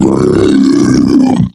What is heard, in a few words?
zombie coming; zombie plague